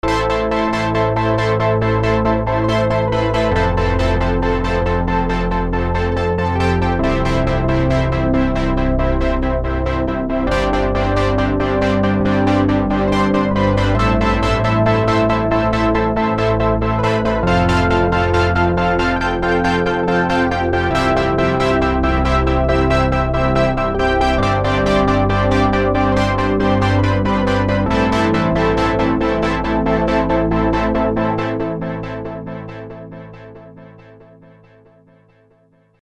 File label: DUSTY DCO ECHOES; redshift 6